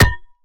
Base Ball Bat Bolted

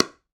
PickelballPaddle Hit Ball Medium

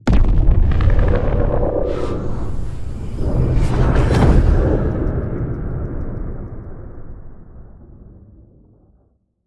Skybox Portal Open Local

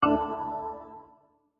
Ring Ball Through; Sports; Training

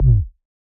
Ball Collision Forcefield Light

